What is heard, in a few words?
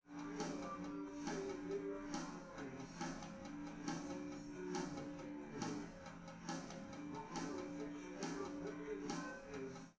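test with drums live rec; SiSEC11; underdetermined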